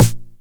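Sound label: 909 SD Tape, snare drum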